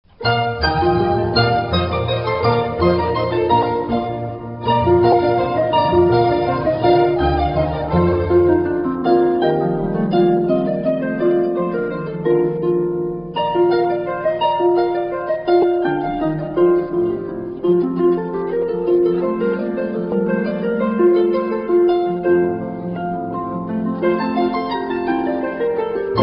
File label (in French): harpe; Allegro Moderato du concerto pour harpe et orchestre en si bémol majeur, opus 4 n°6 de Georg Friedrich Haendel